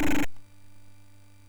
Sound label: sid MOS6581R4; Passing Shot